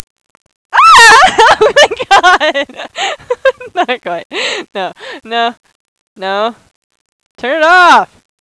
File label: squeal blooper